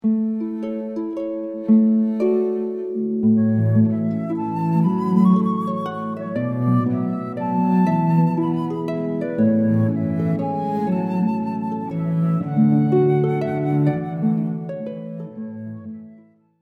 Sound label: harp flute cello